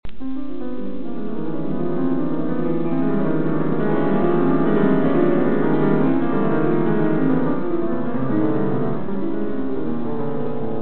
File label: glass music